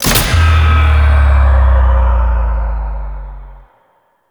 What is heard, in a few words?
Lights Power Down; Warframe; Sacrifice; Liset